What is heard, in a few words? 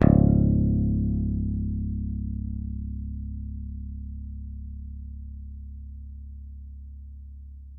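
Yamaha pick C#1, Bass; Masterbits CLIMAX 7; PATITUCCI BS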